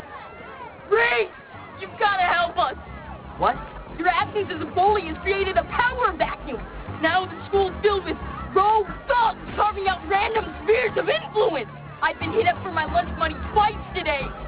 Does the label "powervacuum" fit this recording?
Yes